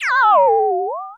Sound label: Beepy Glitch